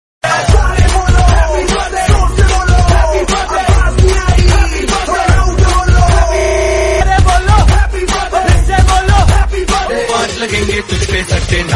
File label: happy birthday music dj